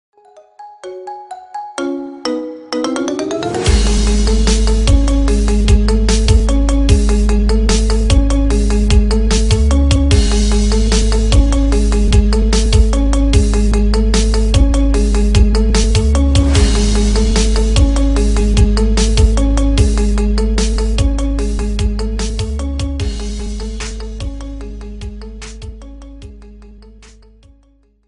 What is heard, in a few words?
marimba ringtone remix